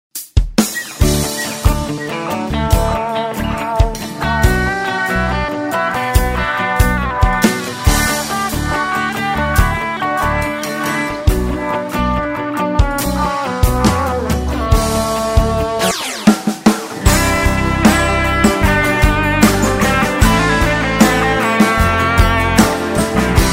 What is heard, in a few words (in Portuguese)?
play backs sertanejos